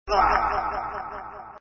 die; pendekar; Aventura

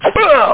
Swoosh Ugh; Chaos

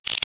slider

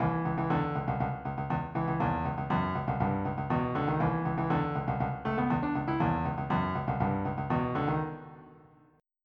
funk; Terra Sound Library

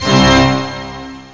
full pipe organ; OctaMED SoundStudio V1; mirage

